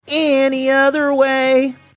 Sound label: singing any other way, exaggerated accent